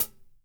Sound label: CHH, HI-HATS; Spectrasonics; Liquid Grooves; LG HI-HATS